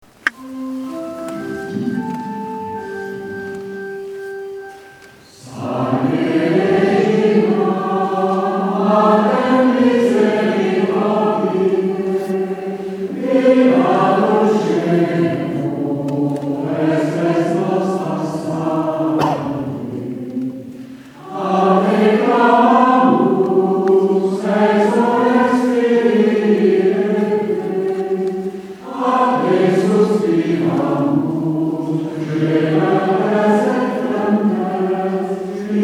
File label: Salve Regina ton simple